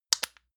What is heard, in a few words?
lotion lid close hard; friseur; berufe